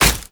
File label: Nunchuck Catch; Warframe; Infested